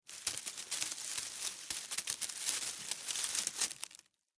Spider Tarantula, Spider, Tarantula, Walk, Leaves, Foliage, Short, Arachnid, Insect, Bug; Sound Effects:Animals:Insects; Spiders; Blastwave